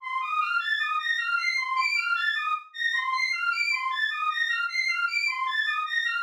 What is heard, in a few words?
Choir-y (154 bpm)